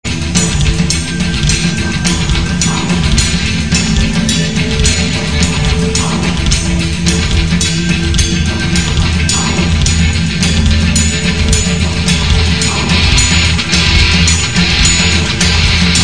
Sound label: loading